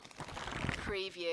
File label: paper fanning book